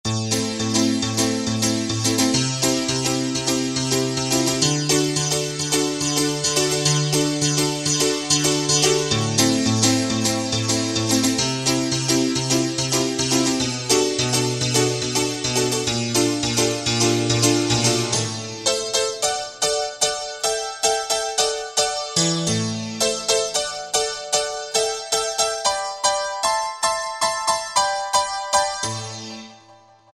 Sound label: 90s Piano, Roland SR-JV80-19 House Collection